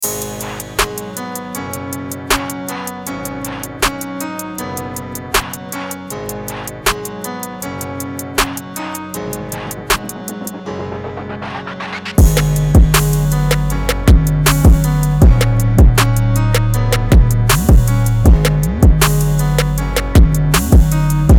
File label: Instrumental